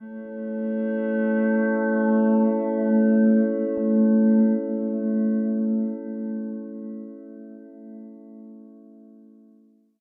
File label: ambi glass hum